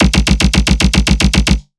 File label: Dirty Bass G 150; One-Shots; Bass Hits (Dirty); Spike; Ghosthack; Color Bass Essentials